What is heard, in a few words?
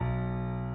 key